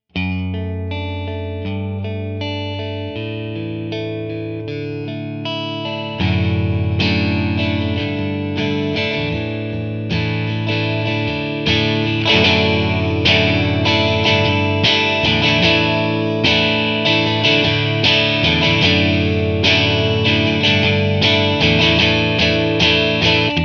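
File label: clean electric guitar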